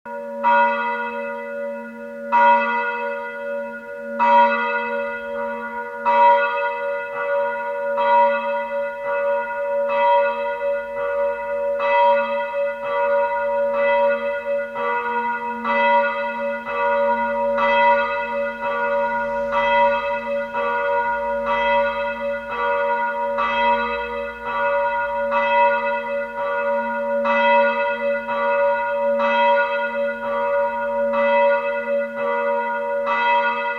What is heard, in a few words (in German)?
Glocke; dom st martin rottenburg